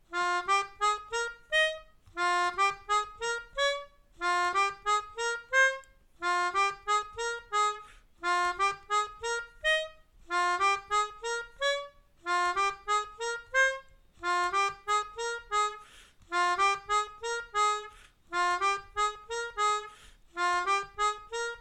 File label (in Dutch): Mijn Melodie, Melodica